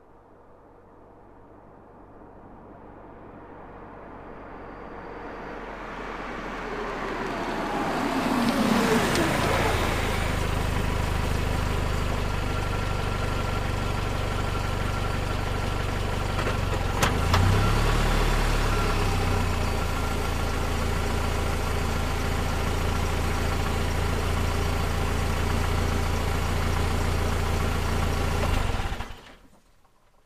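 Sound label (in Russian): priblijenie avto, approaching car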